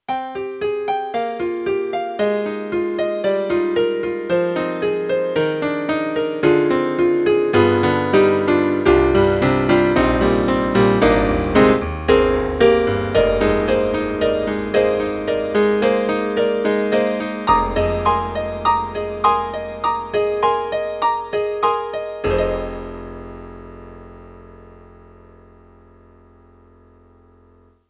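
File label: YAMAHA MDP-5 XG Bright Piano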